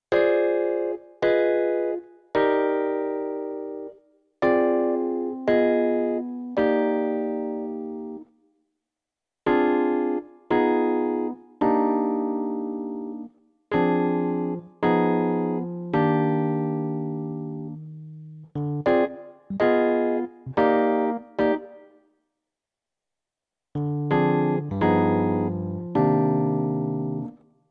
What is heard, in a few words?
minor drop, jazz piano